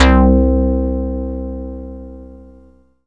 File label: PLINKS, Synthesizers, ANALOG; Soli-Music; World of Synthesizers VOL-1